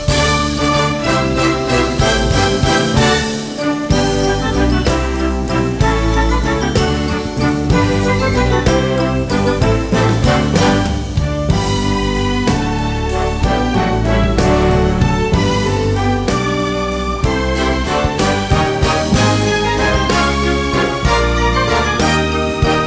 Jingle Bell Medley We Wish You A Merry Christmas no vocals